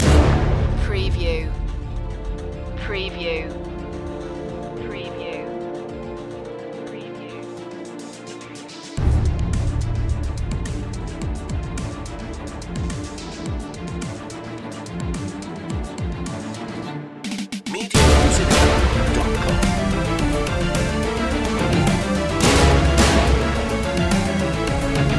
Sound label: battle in 8-bit space underscore